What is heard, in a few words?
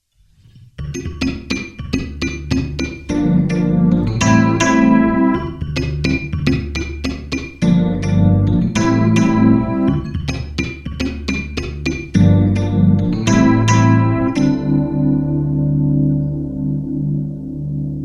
dyno perc, fender rhodes